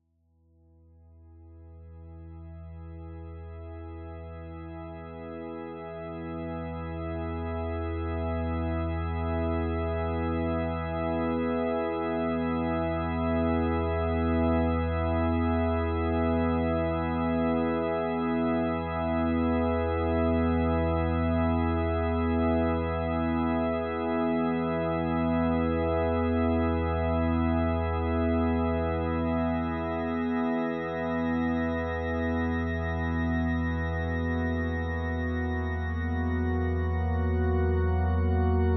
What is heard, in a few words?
morning ramp up